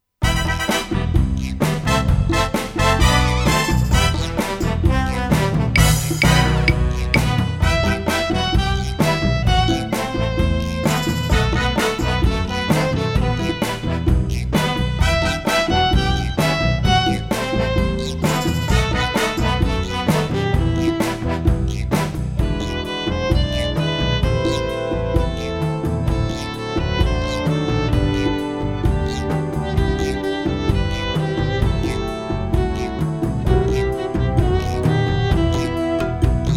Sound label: Cha cha cha; STRADIVARI VOL. II